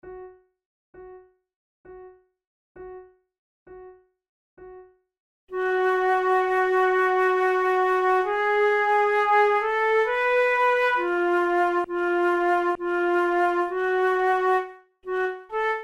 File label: duos adagio, flute; devienne; nouvelle methode pour la flute 18